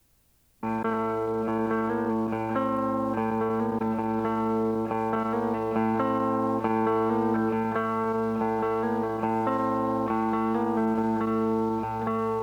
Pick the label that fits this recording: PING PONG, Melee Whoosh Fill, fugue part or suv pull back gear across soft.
fugue part